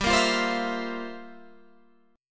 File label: G Minor 13th G down Guitar Open G